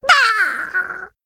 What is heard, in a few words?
Murloc Death